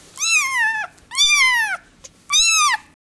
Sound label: Kitten Neg Low Handling